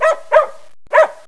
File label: BARKS